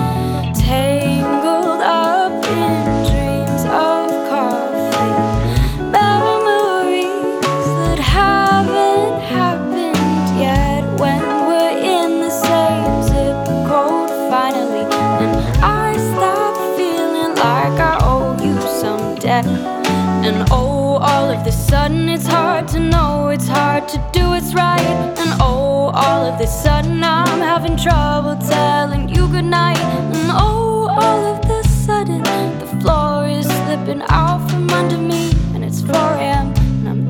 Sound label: january blues